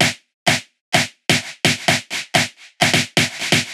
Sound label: perc 128, Electro percussion loops; Anthemic